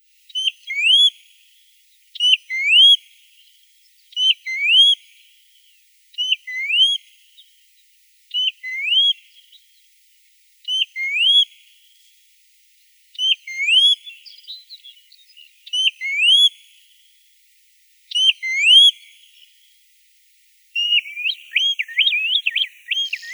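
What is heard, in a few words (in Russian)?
Rzhanka burokrylaja, Pacific golden plover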